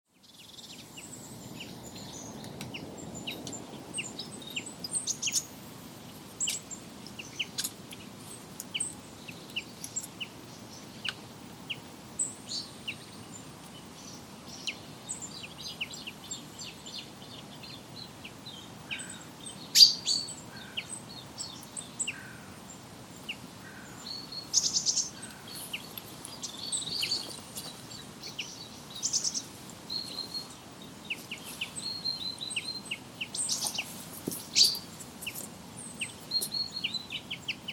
peipon lento; linnut

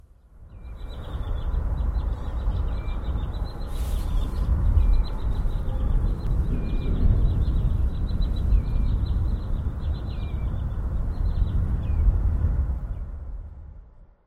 liroparvi; linnut

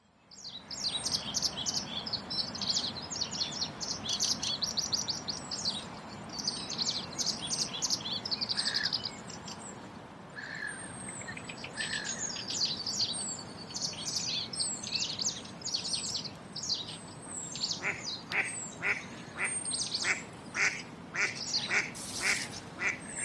vastarakin viserrys; västäräkki; linnut

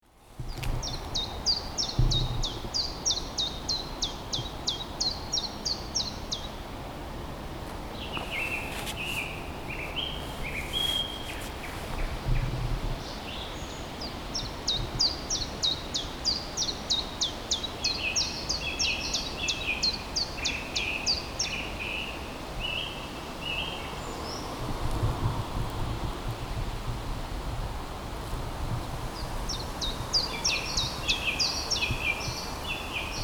tiltaltti; linnut

